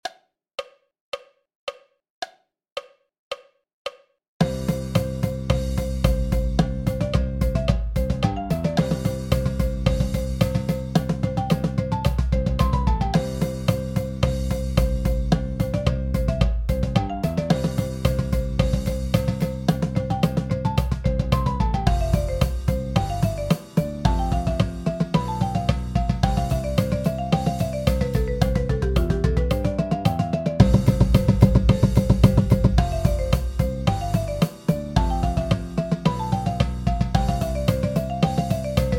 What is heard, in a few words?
110 BPM Paradiddle Breakdown with countoff; Bronze Level; Warmups; Tuscaloosa County High School